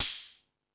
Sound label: High Hat, Drum Machines